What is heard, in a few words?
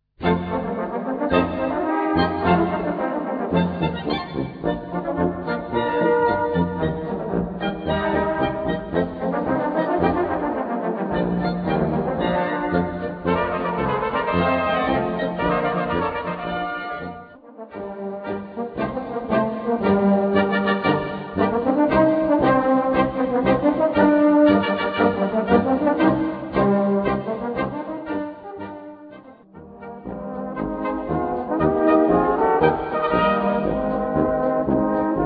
POLKA